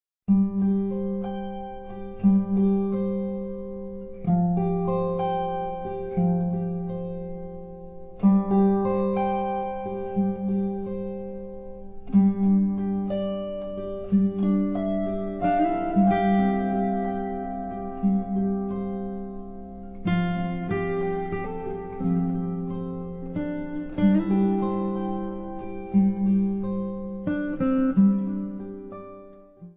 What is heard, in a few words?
Irish Whistle; The Immigrant